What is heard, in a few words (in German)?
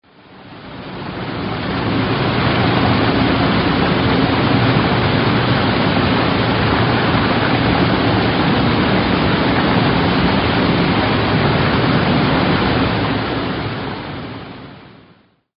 Wasserfall